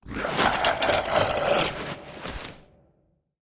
draugr combat idle taunt

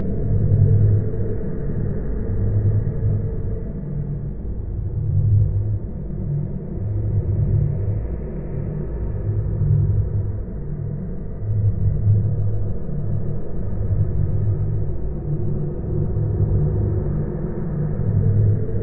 ambt dungeon dwemer generic

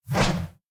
swing axe 2 hand

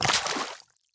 water walk

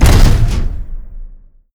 dwarven centurion foot, walk

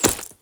armor down

